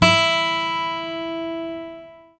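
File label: guitar acoustic; Sound & Vision; Gigapack I CD 2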